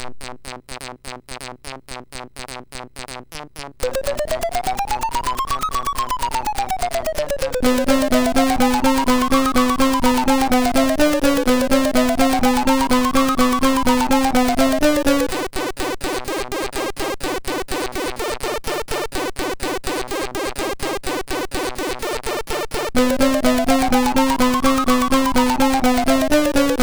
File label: Techno MOS6581R3; sid; Techno Cookies